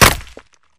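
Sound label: Bullet Light Ballistic Concrete impact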